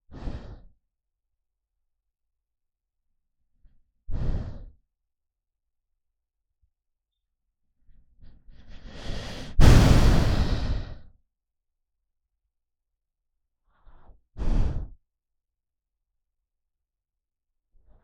Dog Sleeping Breathing

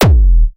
Distorted Bass Drum

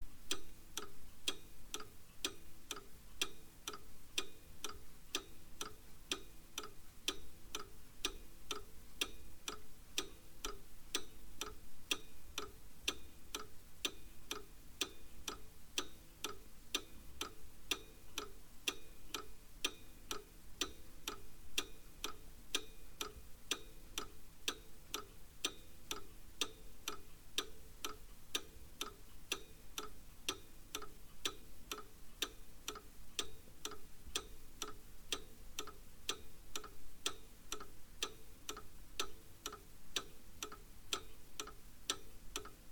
Mechanical Clock

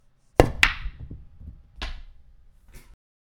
Human Male Breaks Pool

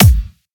Kick Drum Hard

